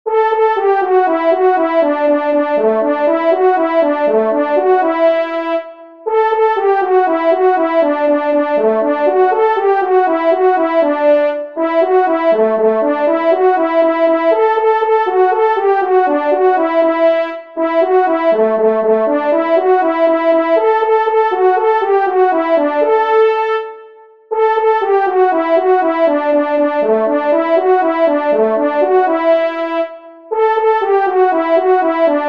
Terre du blaireau Solo

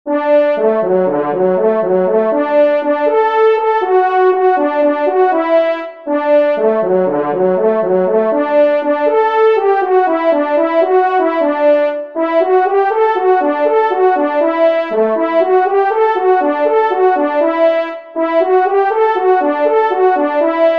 Grand Sanglier Solo, trompes

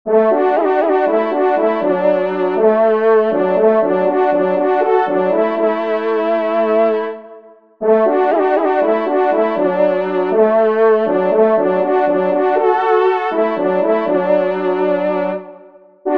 Duos 2 Voix, trompes; Aramon La d

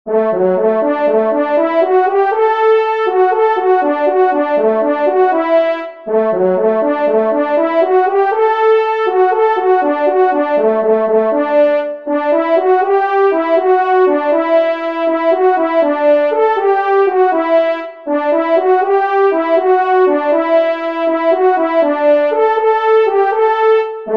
Laie Solo, trompes; Fanfares imposables en Concours

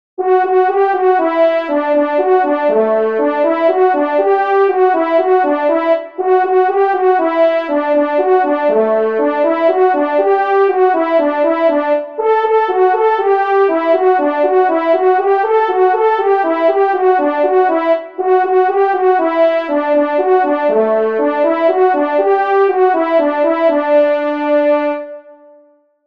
Curée Solo; Fanfares imposables en Concours

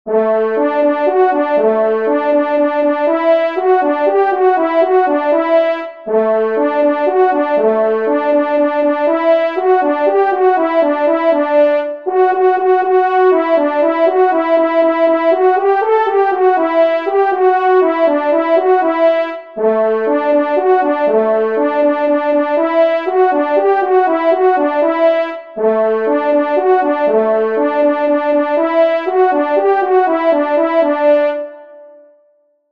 Tete Bizarde Solo

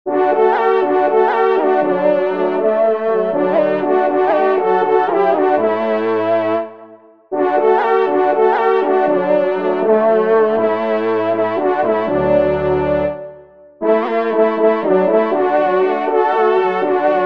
Trompe TRIO; Dupuytrem La

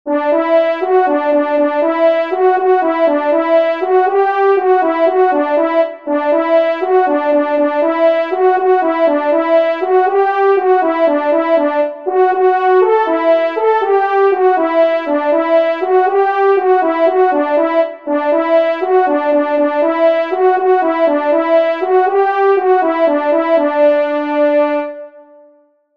Bat l'eau Solo, trompes; Fanfares imposables en Concours

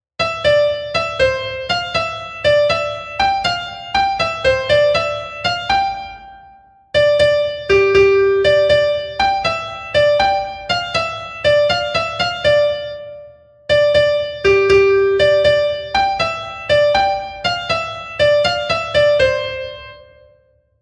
Cerf 3eme Tete, trompes

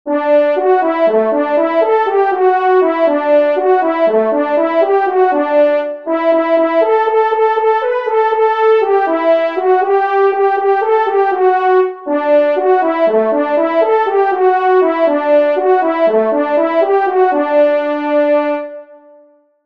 Louve Solo, trompes

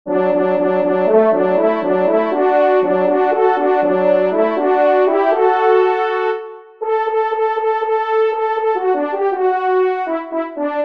Sortie de l'eau Duo, trompes

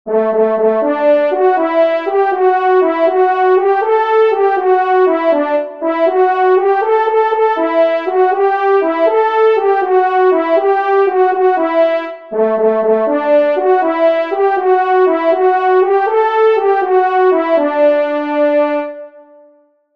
Cerf Discrete Solo, trompes

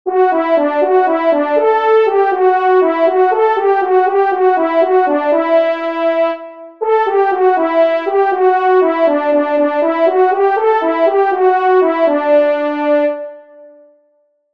Rentree au chateau Solo

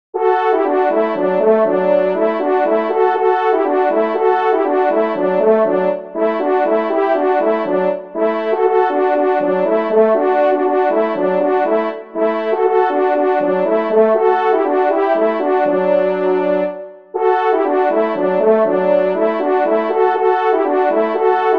Culbute en foret Duo, trompes

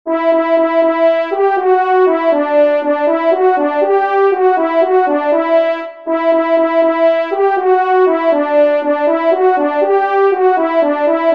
Retraite de grace Solo; Fanfares imposables en Concours

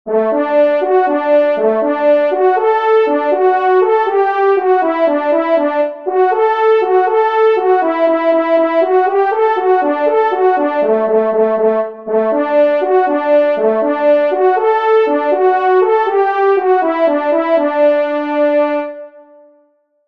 Blaireau Solo; Fanfares imposables en Concours

